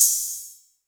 open hat

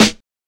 Snares

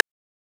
rim, percs

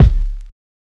Kick

